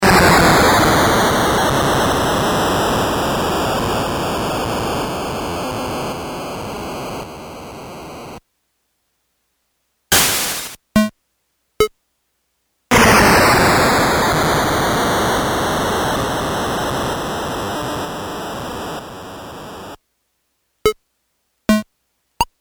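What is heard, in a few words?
Slo Loop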